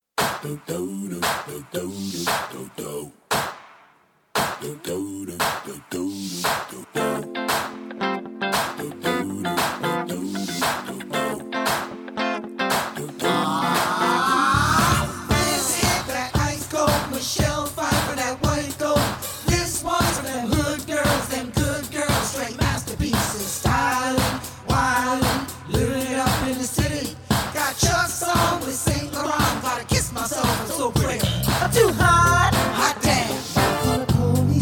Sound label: Funk